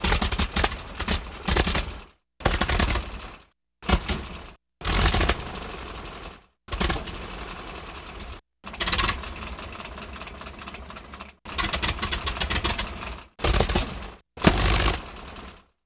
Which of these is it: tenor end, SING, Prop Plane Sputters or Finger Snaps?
Prop Plane Sputters